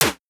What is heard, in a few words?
snare